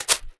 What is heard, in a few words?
Household Shaker, household rhythm samples Hits